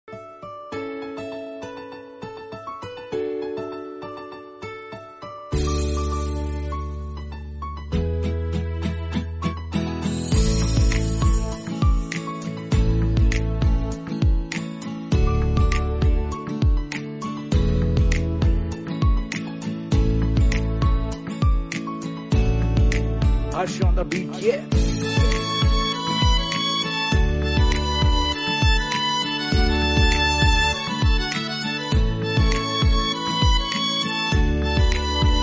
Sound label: Instrumental